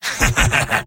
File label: engineer laugh evil; tf2; garrysmod; mvm